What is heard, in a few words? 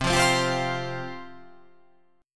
C Suspended 4th C